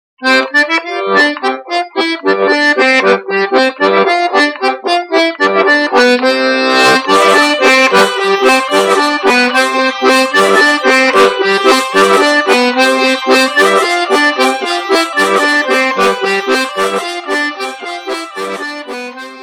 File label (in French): La marmite, france auvergne